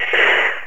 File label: sound tagged by opponent